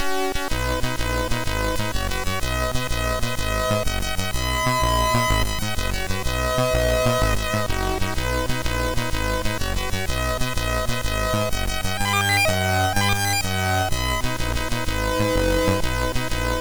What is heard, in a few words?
sid MOS6581R2